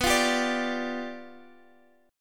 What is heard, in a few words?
G 7th B